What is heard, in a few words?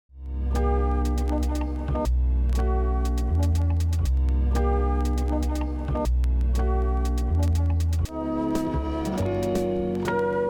Beat